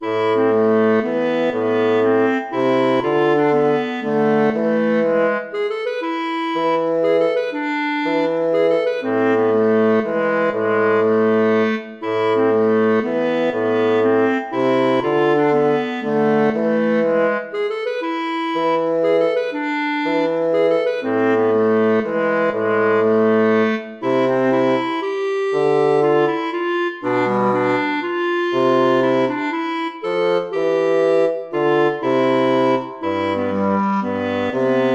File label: baroque minuet minor